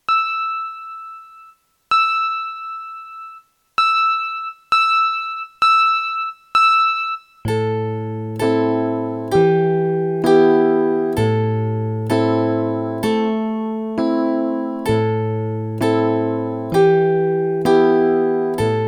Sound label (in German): Ich spiel' Zither, Playalong; Neue Zitherschule